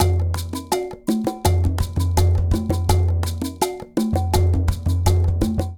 Rhumba Funk B 166bpm, Latin Percussion; Ableton; Factory Packs

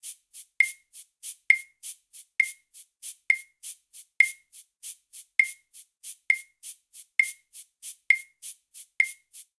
Bossa Nova Cabasa Claves 100bpm, Latin Percussion; Ableton; Factory Packs

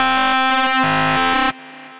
Tonal Randomization, Synth Lead; Ableton; Glitch and Wash

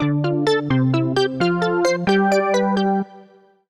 Organ (Dm) 130bpm; Music Loops; Plaza; Ableton; Singularities

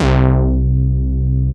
Glideness Bass, Analog; Ableton; Factory Packs; Synth Essentials

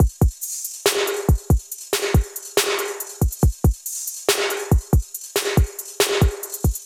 MPE Kit, Trap Drums; MPE Opulent Kit

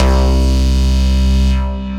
Prime Bass; Ableton; Factory Packs; Punch and Tilt

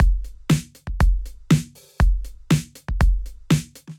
FAT Kit, Drum Kits; Ableton; Drum Booth

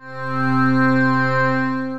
Pad, Synth Pad; Vandenberg Pad; Wavetable

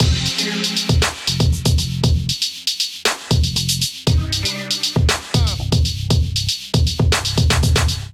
Kit Melodic 118 bpm, Drums; MIDI Clips; Ableton; Chop and Swing

